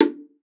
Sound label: Bongos; ProSamples vol.42 - Session Instruments